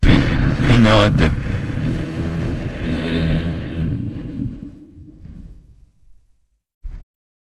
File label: jingle